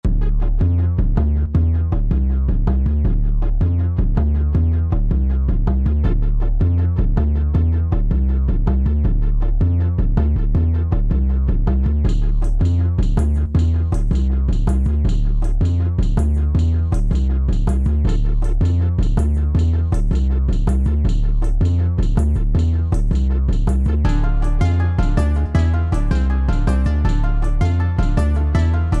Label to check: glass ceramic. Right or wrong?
Wrong